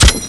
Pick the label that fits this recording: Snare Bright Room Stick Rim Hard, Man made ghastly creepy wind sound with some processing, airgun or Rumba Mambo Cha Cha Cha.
airgun